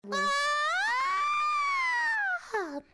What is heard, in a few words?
filly loud screech